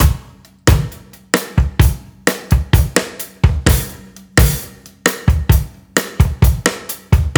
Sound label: Slow C, latin